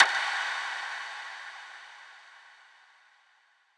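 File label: Ominous Impact; House; FX; Impacts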